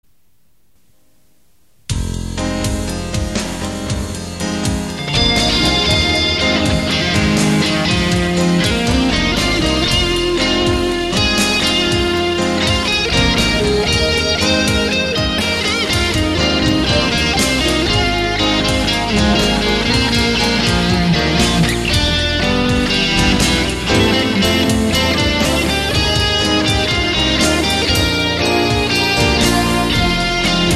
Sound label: Jazz